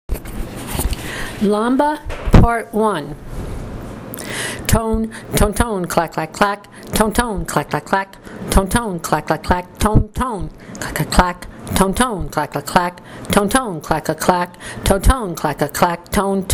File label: with clacks tones, DRUMMING GROUP; LAMBA all parts